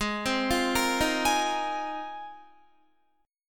G sharp 6th Add 9th, Guitar; G#6add9; arpeggiated; 15,15,17,15,15,16

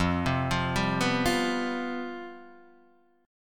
C Major 7th Suspended 4th, F, guitar chord

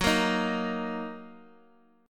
Gb 6th Gb down Guitar Open D

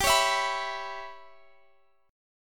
Db Minor 7th Flat 5th G

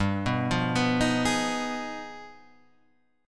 G 7th Flat 9th Sharp 5th, G7b9#5 chord; G7b9#5 Chord {3 2 3 4 4 4} arpeggiated

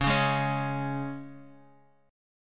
C sharp 5th, Bass Standard; strummed; C#5; JGuitar

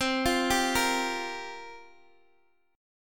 C 7th Suspended 4th, Guitar, DADGAD

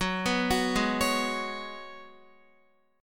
Gb Suspended 2nd Suspended 4th, Guitar; arpeggiated

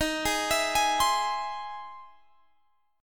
E Augmented Major 7th

